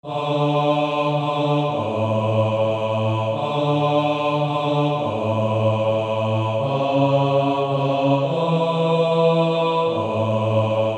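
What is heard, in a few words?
practice music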